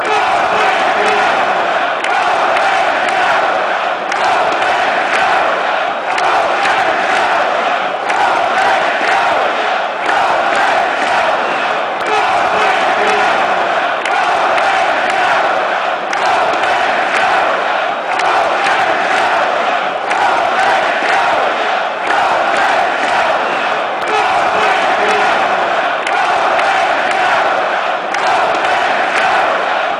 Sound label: go flames go, fan chants